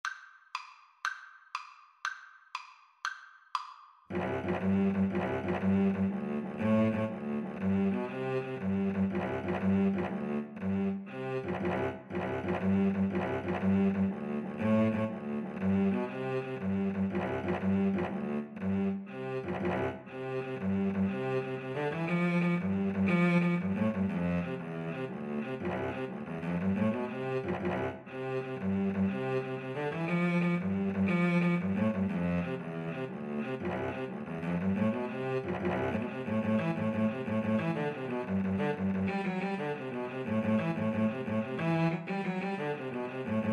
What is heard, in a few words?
irish jig medley, Cello Duet